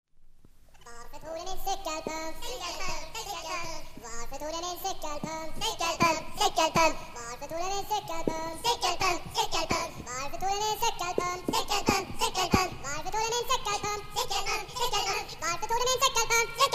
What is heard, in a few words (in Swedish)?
cykelpump